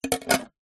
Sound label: tin small empty metal lid on close